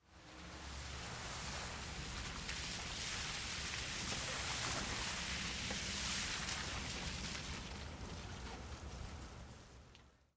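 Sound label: Car By close up On Wet Pavement Medium, Slow Speed, Engine Off.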